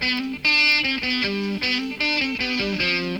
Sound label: guit